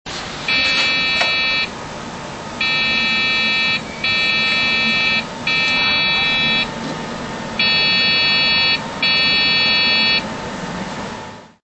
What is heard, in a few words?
Beep Sound